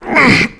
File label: player climb efforts; Call of Duty Modern Warfare